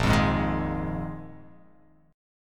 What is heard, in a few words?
A sharp 7th Suspended 4th A sharp